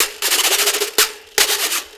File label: Scraper, Percussion Loops; Ambient Grooves